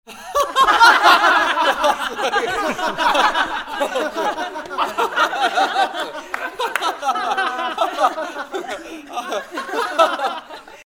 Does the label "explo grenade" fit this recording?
No